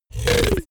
monster growl short; kreaturen

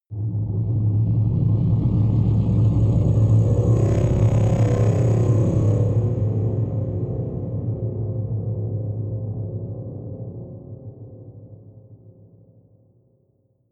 dark ring rumble, Risers; whooshes rises

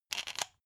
pepper mill assemble; berufe; gastronomie; soundpool

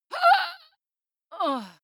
female die; schreie; menschen

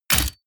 mechanical switch metal small push; industrie-wirtschaft; mechanik

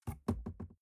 cardboard box open unfold bounce soft; objekte

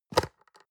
box small open hard

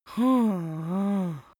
strongly disappointed boy; comedy-cartoon; soundpool

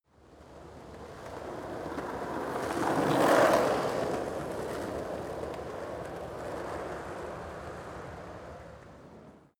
skateboard single drive by; sport freizeit

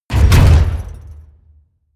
mechanical switch metal large push; industrie-wirtschaft; mechanik

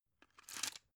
drug blister pull out; berufe; arzt

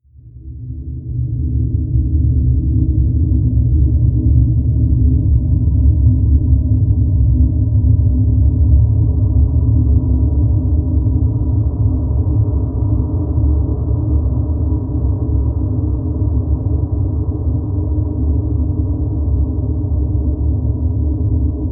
drone noise low

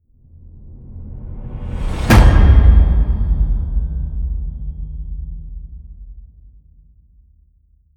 promo pad; whooshes rises

